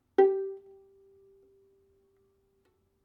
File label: Violin G 5 Pizzicato Non Vibrato